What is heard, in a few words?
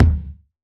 thumpy kick drum d key